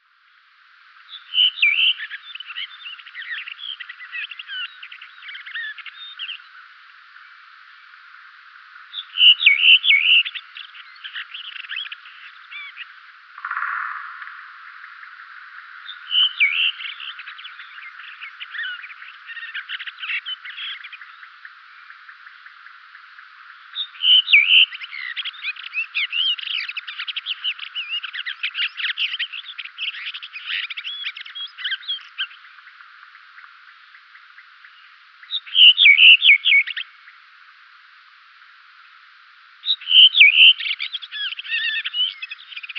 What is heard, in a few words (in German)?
Rotdrossel Gesang